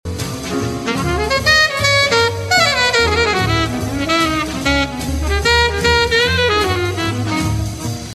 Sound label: ii V